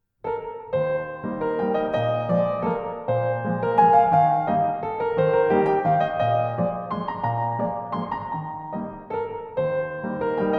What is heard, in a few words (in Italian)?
Valzer pianoforte; von Michael Pamer Musikdirector